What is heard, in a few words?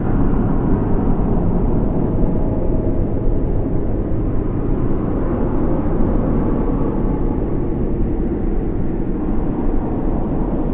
Amb Droning; Silent Hill Homecoming